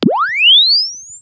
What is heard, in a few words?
Press Two Sides Chirp; Samsung 4 Recordings